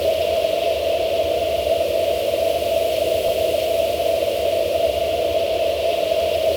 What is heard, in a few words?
Flight Wind, Aircraft; Vehicles; Standard Assets